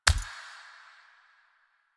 Steps; Warframe; Kalymos

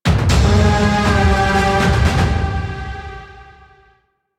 Stinger; Warframe; DE; Kuva Lich; Thrall

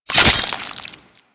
Dagger Slice Flesh; Dual Daggers; Hit Effects; Weapons

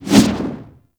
Movement Whoosh Low

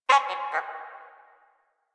flying robot voice; Warframe; Quests; Veilbreaker; Ambience; Kahl Quest

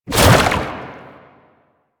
Proto Infested Brachiolyst Projectile Whizz By; Warframe; Abilities

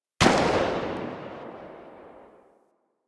Rifle Tail, Weapons; Drake

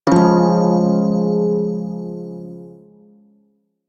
Shawzin Phrygian Chord; Warframe; Prime Shawzin

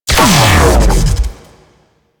Shield Down; Enemies; Abilities; Teralyst

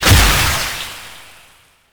Projectile Death Tech Medium; Weapons; Warframe; Duviri; Incarnon Adapters

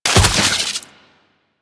Coolant Turret Fire; Warframe; Corpus; Arachnoid; Abilities

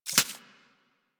Arachnoid Micro Step Snow; Warframe; Corpus

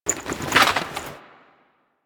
Hunting Bow Load; Warframe

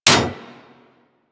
Bullet Impact Generic Enemy Shield; Surface

